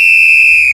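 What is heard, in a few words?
whistle; stamm; svistok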